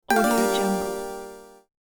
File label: interface reveal arp down; interface sounds